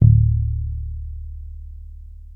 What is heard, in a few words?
Bass one shot Muted Low D, Electric Bass; Slate Digital BASSHOLE Sample Pack